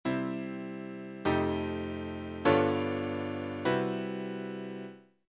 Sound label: 1 4 5 1 full chords